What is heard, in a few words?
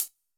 closed hat; Greytsounds; Scott Peers Sound Engineering